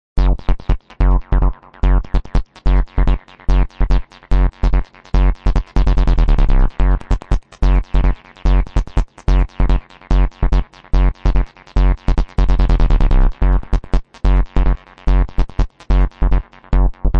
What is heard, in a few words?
eko acid bass, wobble techno